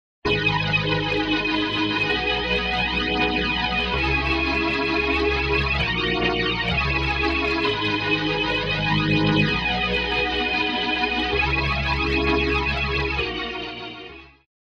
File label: blurry cabinet organ wet